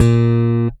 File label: A#2, Bass; Masterbits CLIMAX 7 - Bass; KEN SMITH BS